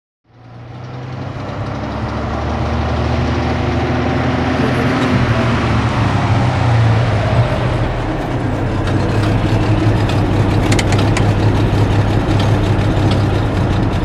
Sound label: 1930s Rolls Royce slowly stops and switches off; hollywoodedge